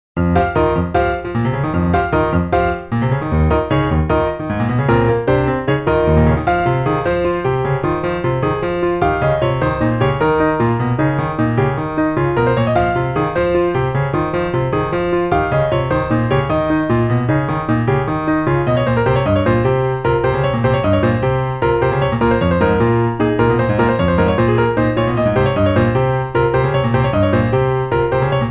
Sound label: piano; 雷電 - Fighting thunder; RAIDEN